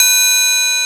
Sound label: JX CHIME, Jupiter Synths; E-MU Formula 4000 Series Vol. 3 – Analog Odyssey